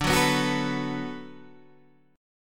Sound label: D 6th D down Guitar Open D, chord